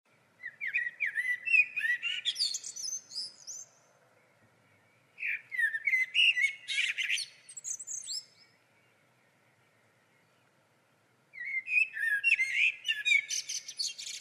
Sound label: Turdus merula; SETE